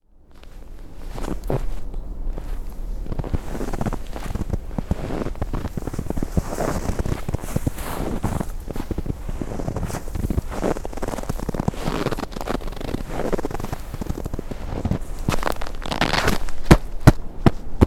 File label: snow, sergiyev posad